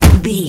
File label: punch heavy huge distorted